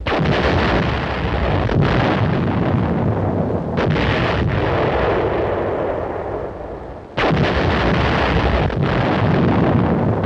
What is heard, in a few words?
Cannon Classic Old; shots